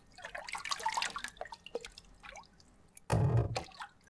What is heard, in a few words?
pit water lap; acqua